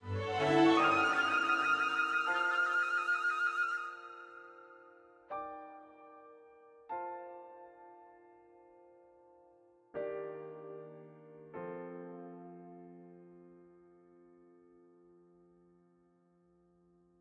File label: full horns; gamerip; Sky Children of the Light gamerip